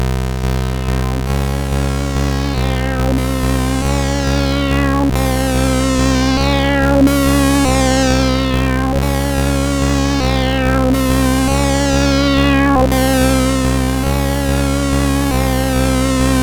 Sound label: sid CSG8580R5; Empire